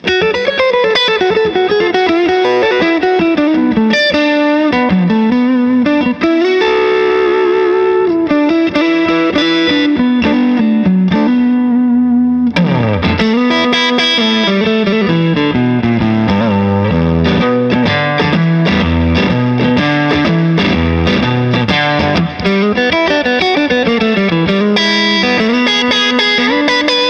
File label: Dirty New EQ